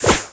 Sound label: ROCK